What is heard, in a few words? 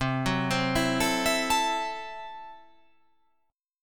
C 6th Flat 5th C down